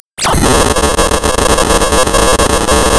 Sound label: Sound of tearing paper, center